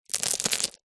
foley pebbles many small drop low height soft surface